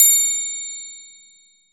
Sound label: TRIANGLE, GM PERC KIT; AKAI S-Series CD-ROM Sound Library VOL-5